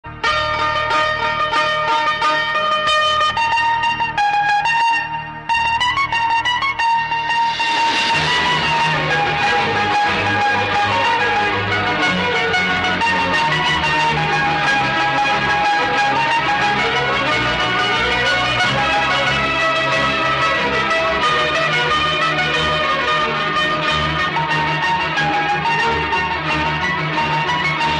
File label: south bgm